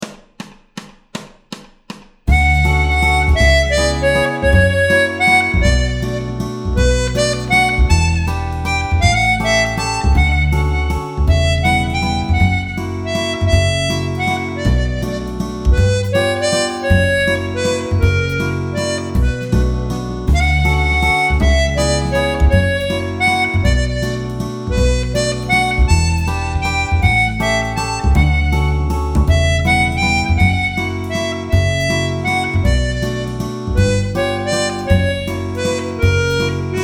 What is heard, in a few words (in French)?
sans Piano